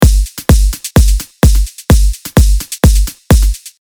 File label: Mainroom House